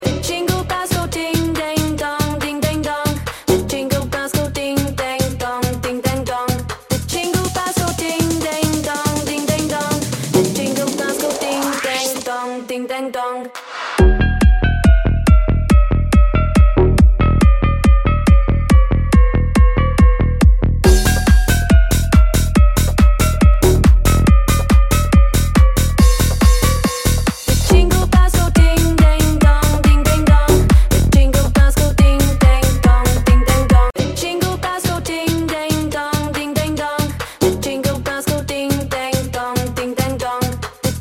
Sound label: jingle bells ding dang dong